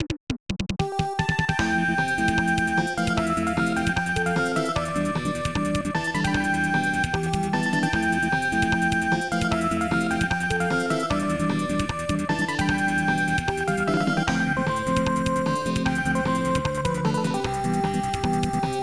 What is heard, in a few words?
High Score!; Kablooey; SNES; JPN Version